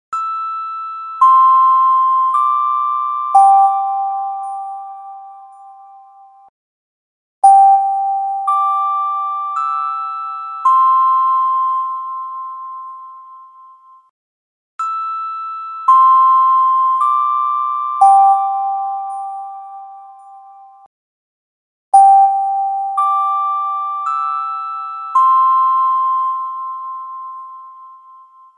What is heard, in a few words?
chime